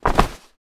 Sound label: Human body fall on concrete